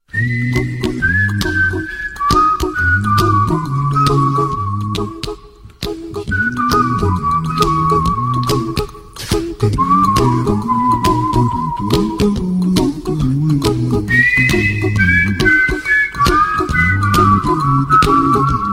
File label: blackberry ringtone